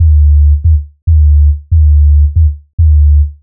140 D BASSLINE, Dubstep; Loopmasters; The New Sound Of Dubstep; BASS LOOPS

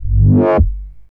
DISTORT FWAB G, bass; Loopmasters; Jack Sparrow; Deep Dubstep Progressions

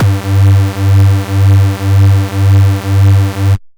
Dirty Dutch Lead G2; Vengeance Electroshock Vol.2; VES2 Dirty Dutch Special; VES2 Dirty Dutch Leads

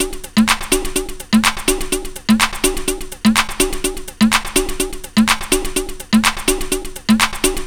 125 BPM MPC, Digital Breaks; 54%; Loopmasters; Jon Carter & Alex Blanco; Block Party Essentials

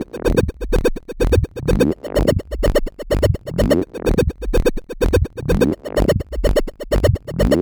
126 E G music, Funky Underground; Loopmasters; Funky Underground Vol 2

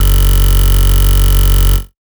Bass Shot F#; Mutekki Media; Vengeance Electroshock Vol.2

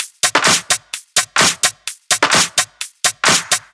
Loop, 128 BPM

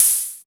Open Hihat

